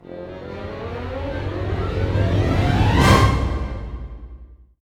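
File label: 158 magic, orchestra; East West; Scoring Tools; Magic Bottle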